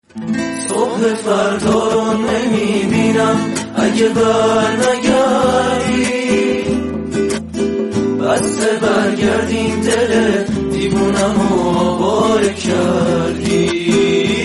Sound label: Group Performance With Guitar; iranian